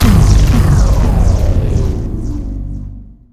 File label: Lose Stinger Electronic